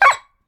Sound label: dog